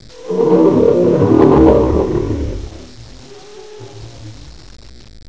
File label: death, bug; scourge